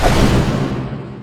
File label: attack; bane